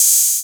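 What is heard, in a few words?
808 hi-hat; Wizoo - Platinum 24 Electronic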